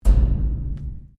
Strike Metal Hollow Tank

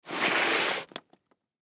Briefcase Pull, Briefcase Pull Toward; Slide Sound Effects; Objects; Foley

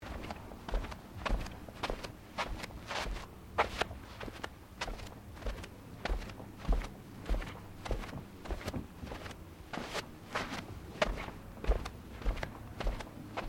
Footsteps Bare feet Carpet Sequence; Footstep Foley; Footsteps (Sound Effects:Human)

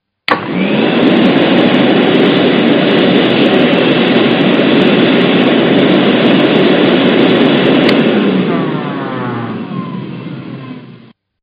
Vacuum Cleaner; Cleaning; Sound Effects:Offices; Partners In Rhyme

